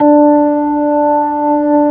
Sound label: ORG B3, B-3 Organs; Sound & Vision; Gigapack I CD 2